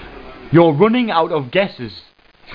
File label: running; Aminet; AmiGuess